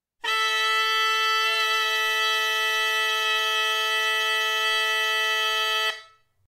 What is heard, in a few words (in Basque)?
Alboka nota luzea LA quinta